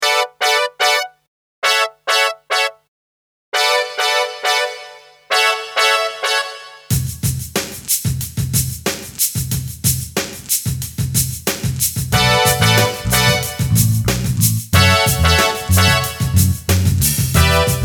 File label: Lexicon Brass Plate